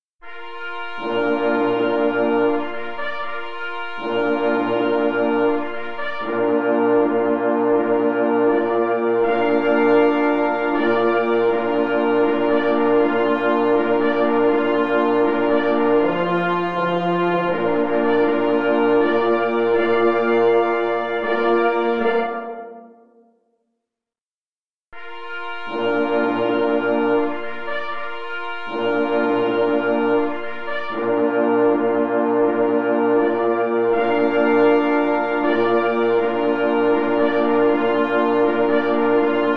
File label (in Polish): Hejnal Festiwalu Krakow